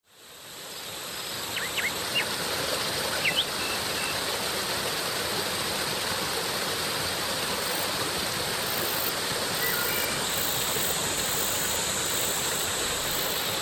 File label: Waterfall